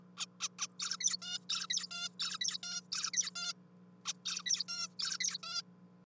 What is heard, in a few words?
bird recording